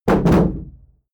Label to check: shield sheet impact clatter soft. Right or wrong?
Right